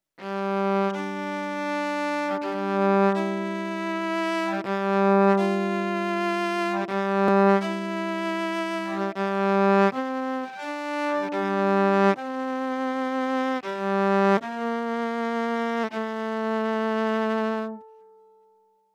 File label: Violin